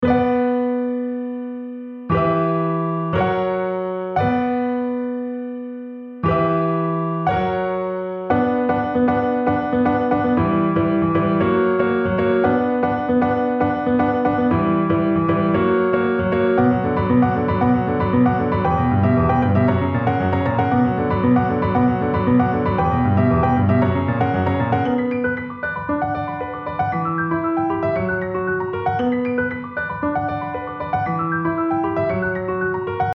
big drum overhead

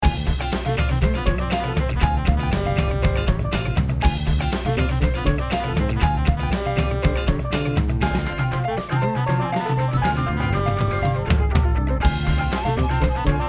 comp auto release

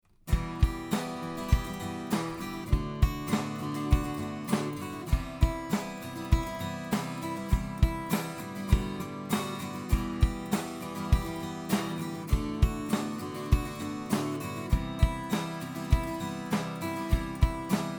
AKG C 451B air mix

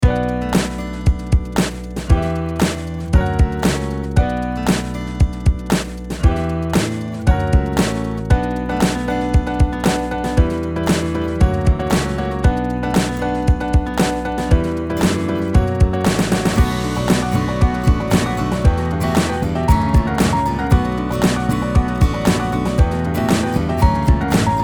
piano steinway; Logic

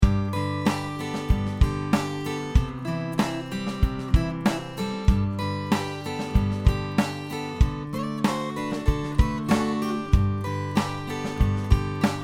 CHANDLER Limited TG 2 500

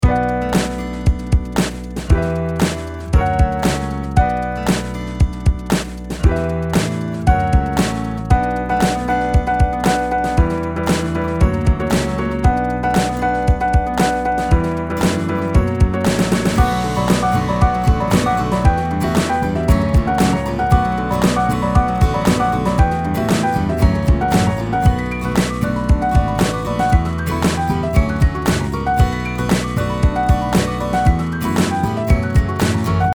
ad keys

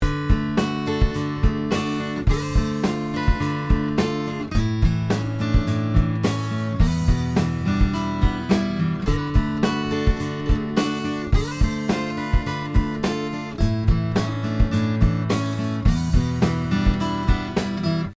MXL R144 neck hole

